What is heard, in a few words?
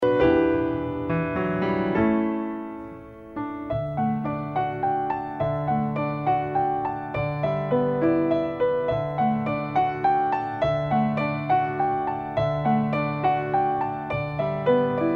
longing for spring instrumental